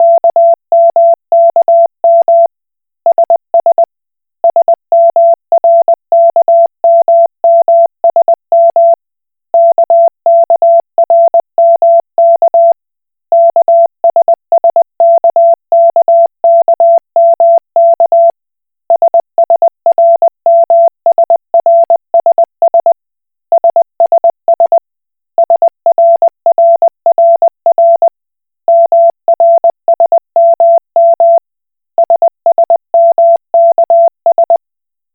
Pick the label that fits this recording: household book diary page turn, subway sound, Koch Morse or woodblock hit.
Koch Morse